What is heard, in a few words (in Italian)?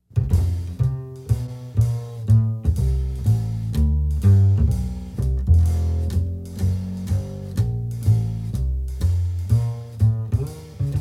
contrabbasso walking